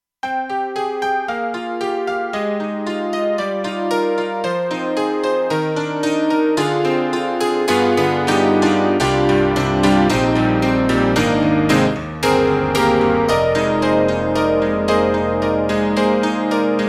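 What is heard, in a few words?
Roland SC-8850 Honky tonk, piano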